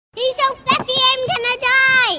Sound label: Fluffy Message